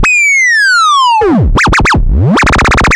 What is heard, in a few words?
FX MS20